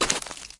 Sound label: snowball impact